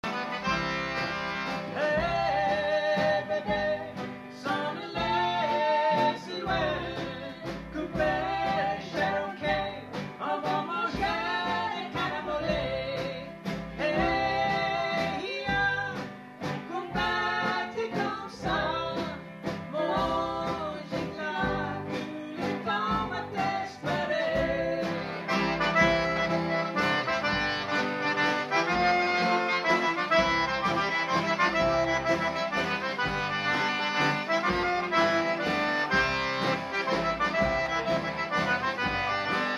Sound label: Cherokee Waltz